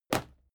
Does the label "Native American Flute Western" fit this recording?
No